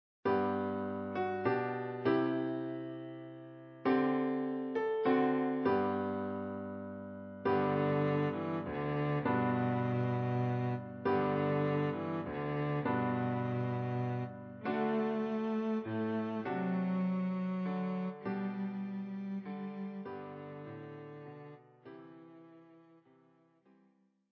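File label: Silent Night, Violoncello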